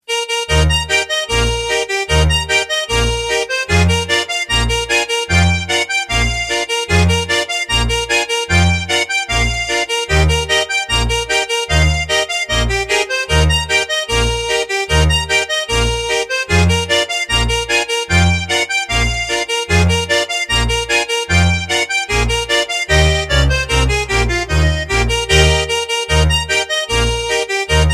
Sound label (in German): Sappli Polka